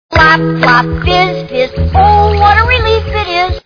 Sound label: plop